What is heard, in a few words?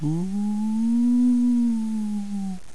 ghost call